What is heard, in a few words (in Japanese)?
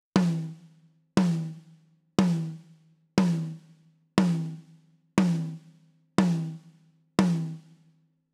ドラムサウンド TOM